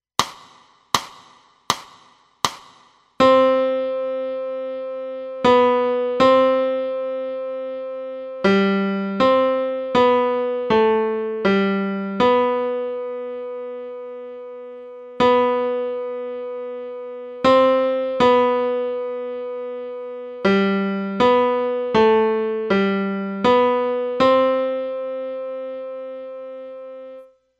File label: piano